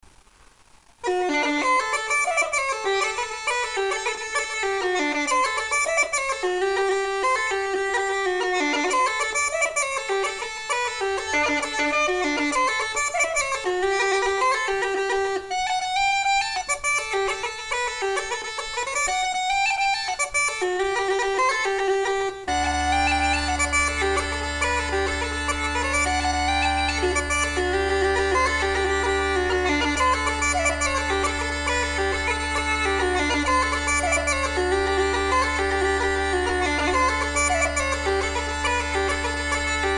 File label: maple halfset, uilleann